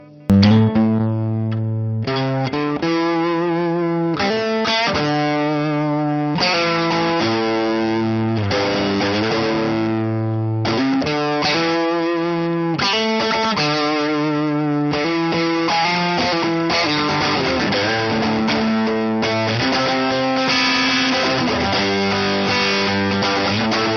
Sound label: Vox AC15 Tele